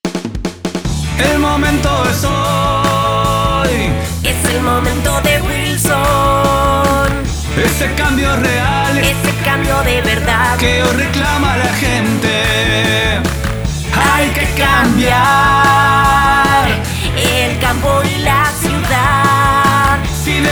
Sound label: jingle